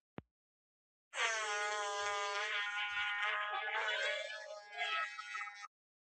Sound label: fart